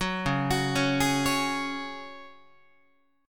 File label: Db Suspended 4th Db, Guitar; arpeggiated